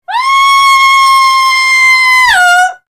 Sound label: Macaw Vocalizations. A Short, High-pitched Macaw Yell. Close Perspective. Bird